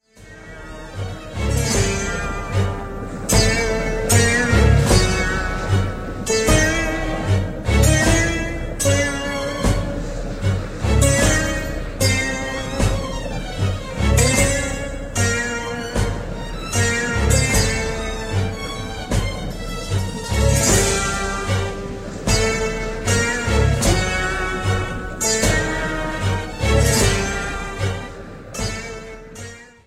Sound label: Bowser Theme; SM3DL